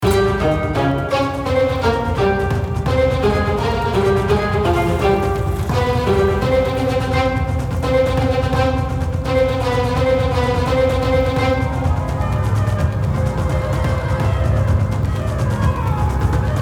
Hielo Guerra Variacion

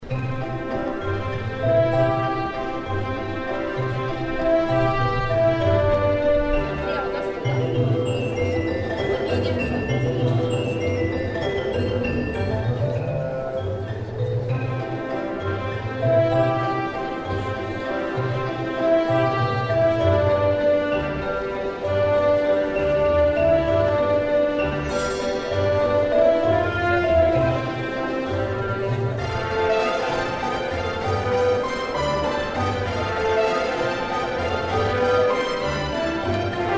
Música De El Circo (Con Ambiente); el chavo una feria en la vecindad; ios mobile gamerip 2012

